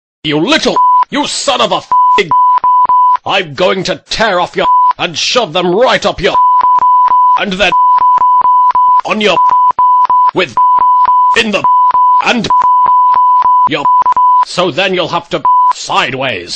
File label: lotta bleeps